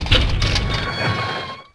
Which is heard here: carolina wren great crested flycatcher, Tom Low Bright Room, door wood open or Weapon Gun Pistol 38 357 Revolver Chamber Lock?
door wood open